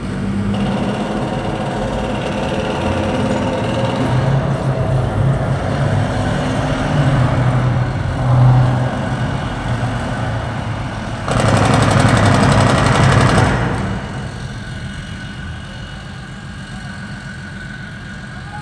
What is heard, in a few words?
Amb Destruction; Red Orchestra Ostfront 41-45